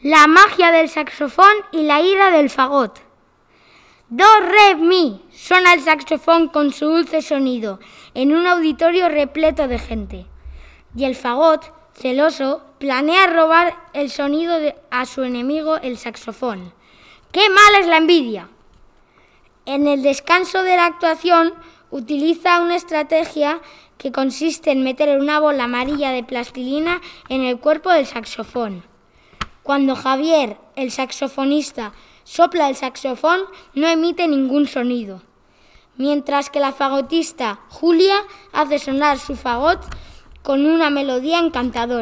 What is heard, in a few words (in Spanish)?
LA MAGIA DEL SAXOFON Y LA IRA DEL FAGOT